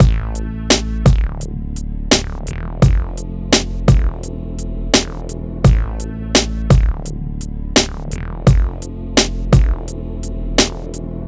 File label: MIX, Hip Hop and R&B Drumloops; Best Service; ProSamples vol.01; AKAI